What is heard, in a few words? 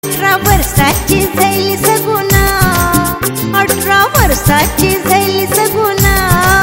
Marathi Ringtone